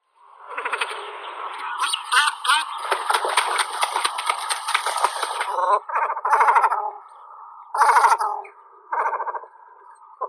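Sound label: great cormorant, phalacrocorax carbo; baklan bolshoi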